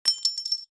Nickeldrop

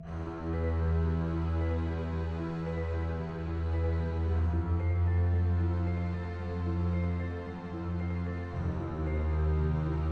amb music

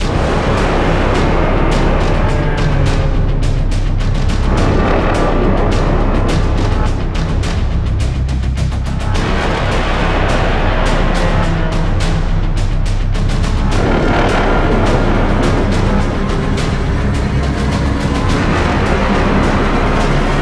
Base Loop low

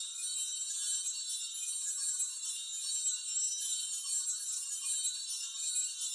Mystery Box Loop Shimmer; Subway Surfers City; Android, iOS

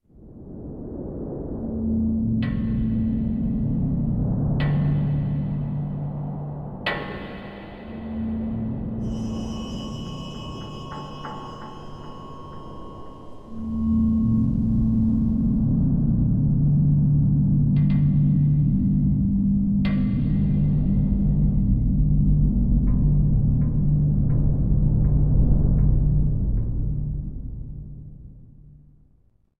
Amb Outdoor; The Hobbit; PS2

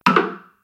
Se Door

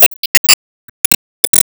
Enemy Gear Common Appear

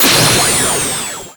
Enemy Ufoblock Active Shot; Disney Star Smash; Android, iOS, Mobile

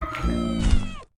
Kill Cave Dweller; Lethal Company

